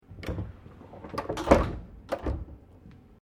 Train JR Regional Aomori Local Door Connecting Open, Japanese Trains; Vehicles